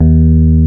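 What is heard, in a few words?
piano Rhodes Mk.V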